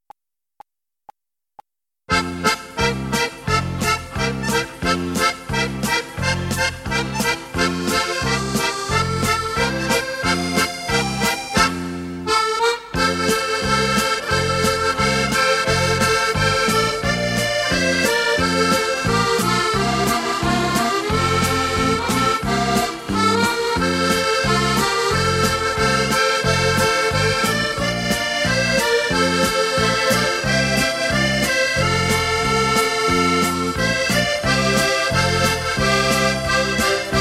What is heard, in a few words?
Accordion F